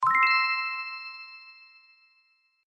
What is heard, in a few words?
Correct